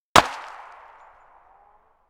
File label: body distant; Escape from Tarkov